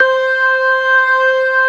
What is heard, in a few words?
ORGAN COMBOS; Keith Emerson Signature Series